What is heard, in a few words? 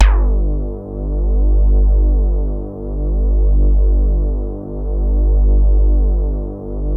CAT HARD G2, DIGITAL BASS; Music Store - Claudius Diemeter Schwerlos